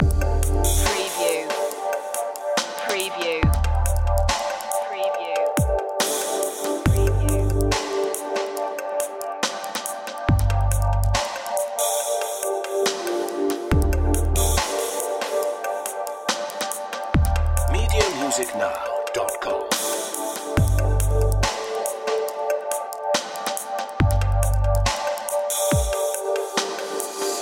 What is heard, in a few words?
tranquilizer loop